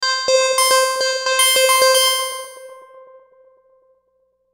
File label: Logo Bell Melody Delay; Sonic Logo; Blastwave; Sonic Logos; Production Element Imaging Element Accent Transition